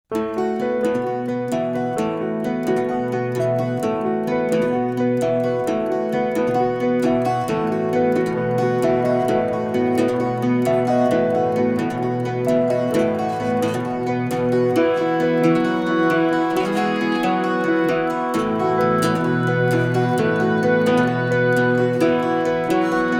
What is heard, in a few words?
Ripple In The Water, acoustic studio